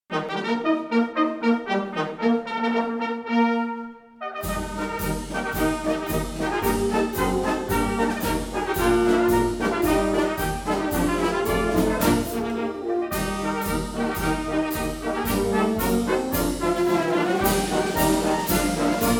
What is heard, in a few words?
March; Forårskoncert i Riddersalen på Frederiksberg Slot